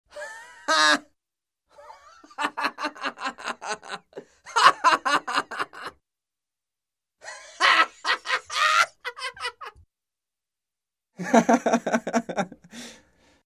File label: Male laughter